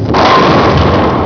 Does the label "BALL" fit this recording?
Yes